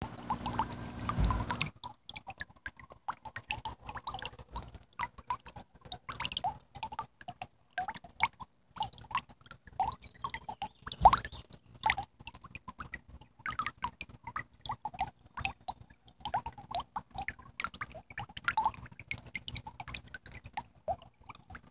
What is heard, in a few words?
water